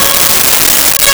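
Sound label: Steel String Guitar Db6; Nintendo; July 2020 Gigaleak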